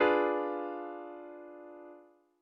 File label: d dim; ackordtabell